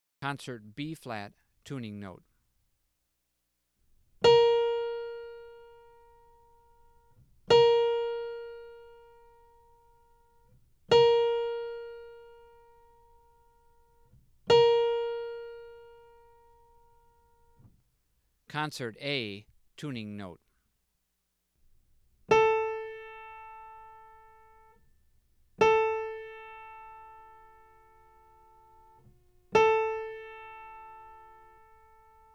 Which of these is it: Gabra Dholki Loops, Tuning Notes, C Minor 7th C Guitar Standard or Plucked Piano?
Tuning Notes